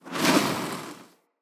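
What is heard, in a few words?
Corrupted Slug Thorn Projectile Whizz By; Creatures; Abilities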